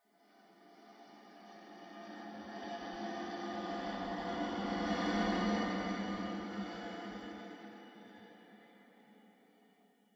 Combat Crack

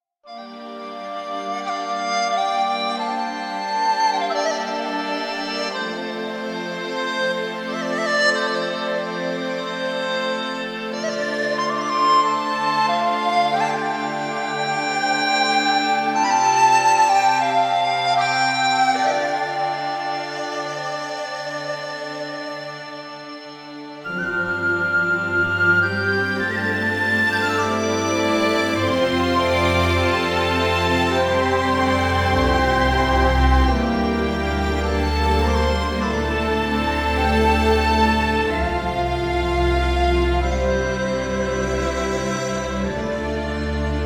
Scene Music; Age of Wushu; Windows

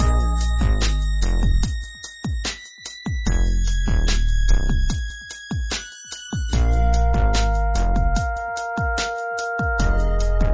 Music Background; ben 10 battle ready online gamerip 2006

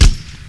fire 50BMG

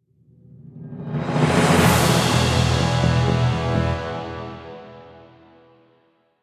Battle End; mass effect 2; Dantius Tower Level 2

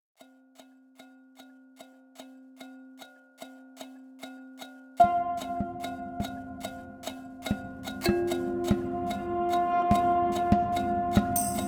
Transition; Ozymandias

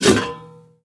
Open; Peace, Death

